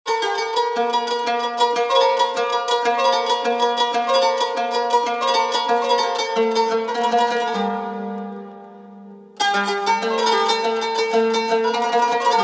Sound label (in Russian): instrumenty Kanun